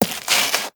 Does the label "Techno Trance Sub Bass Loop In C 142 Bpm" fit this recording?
No